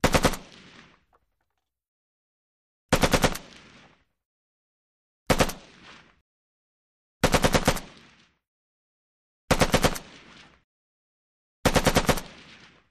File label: AK47 Various Bursts